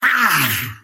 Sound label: pain big